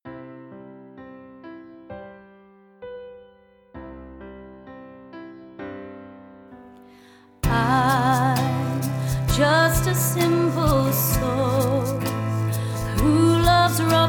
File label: vocal; Glass Slipper